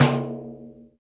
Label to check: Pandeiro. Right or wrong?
Right